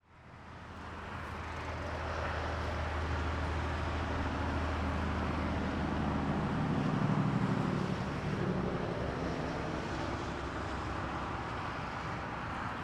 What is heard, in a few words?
Highway Interstate